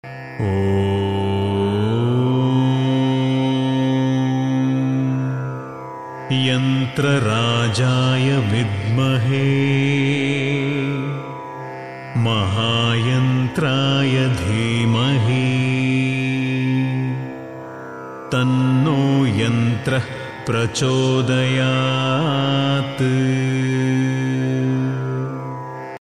yantra gayatri

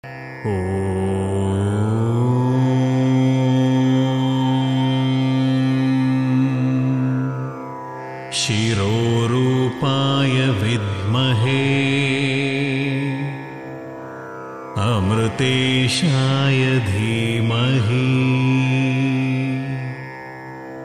rahu gayatri

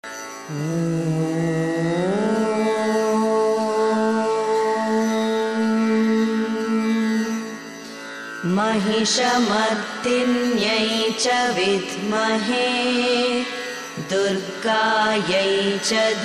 mahisha asura mardini gayatri

